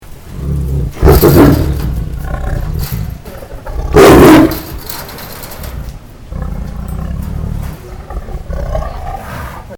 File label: tiger snarl; animal sounds